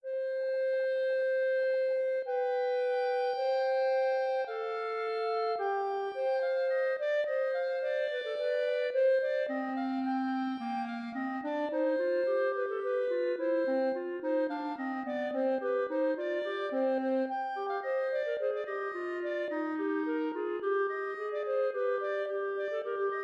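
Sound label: fantasia clarinet duet; duo no.1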